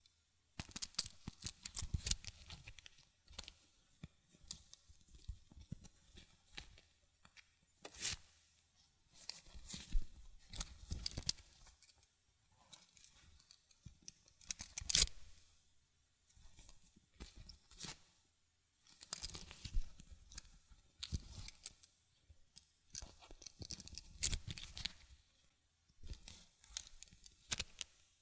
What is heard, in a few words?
Belt Buckle Opening And Closing